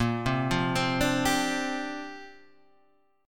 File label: C 9th Suspended 4th A sharp